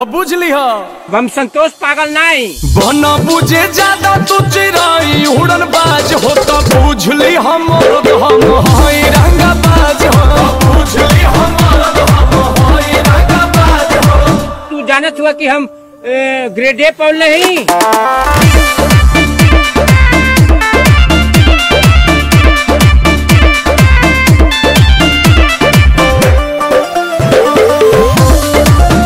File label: Ringtone